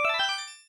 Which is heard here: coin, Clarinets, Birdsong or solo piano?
coin